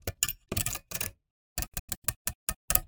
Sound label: telescope adjust